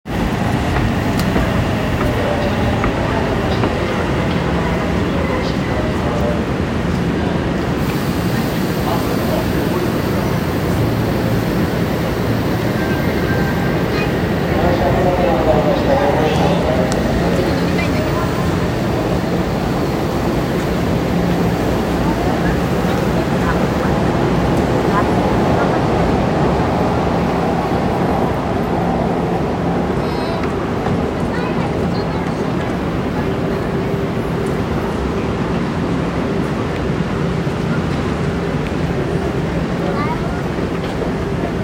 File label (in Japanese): 小田急線登戸